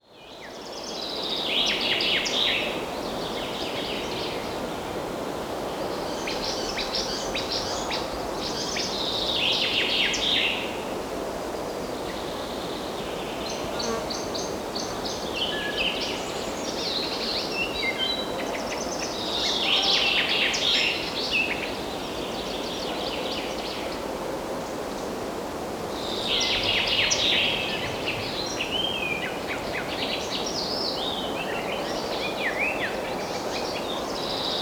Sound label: Forest Birds; افکت صدا حیوانات; Animals